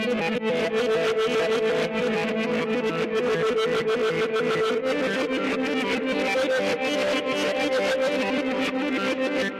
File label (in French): Sound Pro Guitare; Remember Me